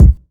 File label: sharp steel kick drum sound C key, bass drum one shot tuned to the C note